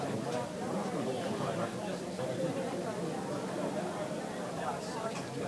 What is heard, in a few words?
crowd; de elkantara